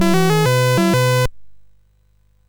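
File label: Number Tumbler, MOS 6581 SID chip music, games; hvsc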